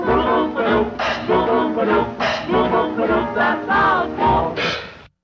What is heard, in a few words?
Boop boop de boop